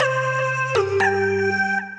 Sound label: Plastic Chromatic, Transformed Voices; Ableton; Factory Packs; Voice Box